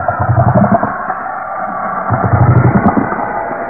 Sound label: water breath, ichthyosaur; hl2